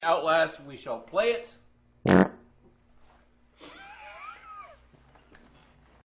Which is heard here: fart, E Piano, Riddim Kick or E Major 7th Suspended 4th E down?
fart